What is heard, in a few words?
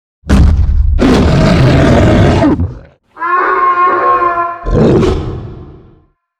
Monster Bonus Win; rampage arcade gamerip 2018; Monster Ralph